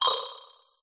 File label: negative game sound fx arcade casino kids mobile app